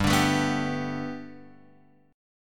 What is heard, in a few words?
G Major Add 9th Guitar Open F, chord; x,2,2,4,2,2; Gadd9 strummed